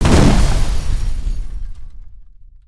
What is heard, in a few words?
Impact; Fig On Fire